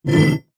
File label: Metal Weight Slide, Foley, Metal, Weight, Slide, On, Metal, Drag, Short; Weightlifting